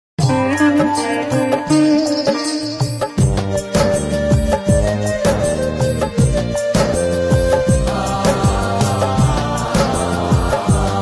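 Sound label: original ringtones and notifications Nokia Asha 302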